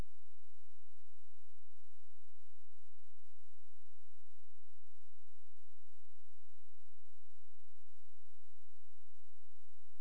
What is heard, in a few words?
cricket; svencoop